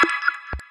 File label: leave game; RA2YRMO Simplified Chinese